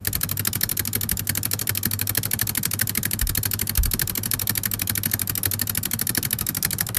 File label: sound of typing machine; rhythmic